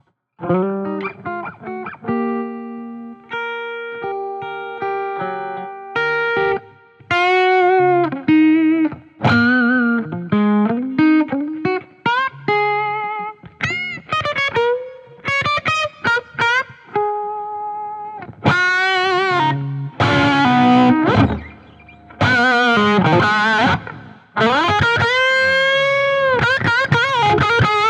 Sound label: blues dynamic noodling